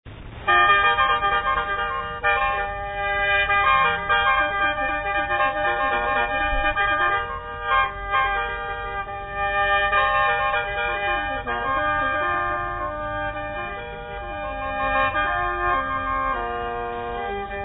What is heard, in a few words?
Khaen song, Lao Khaen Music